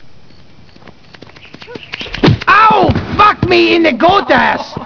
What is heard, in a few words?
goat